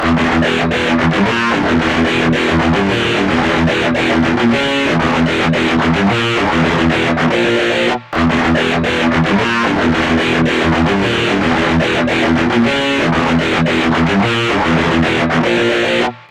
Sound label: death metal ringtone